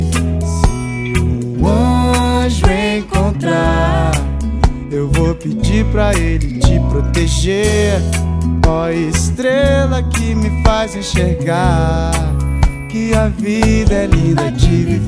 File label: reggae brasil